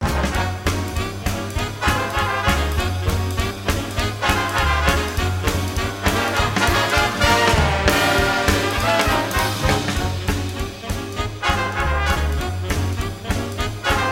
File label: Bigband